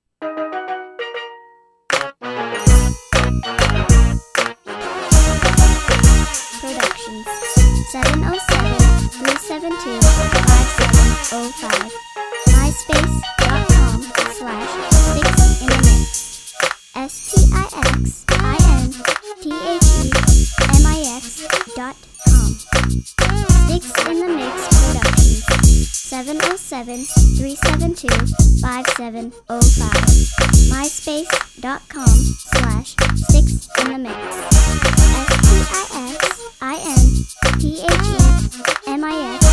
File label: steeldrums